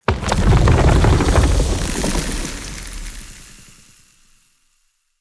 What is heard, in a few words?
Root Growth Bridge; Puzzles; BeanStalk